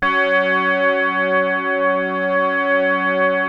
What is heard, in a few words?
PPG F#3; Hollywood Edge - Giorgio Moroder Rare Synthesizer Collection; PPG.2.3